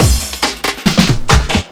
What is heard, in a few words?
Loops Fillin140; Vengeance Samples; VEC1 Fills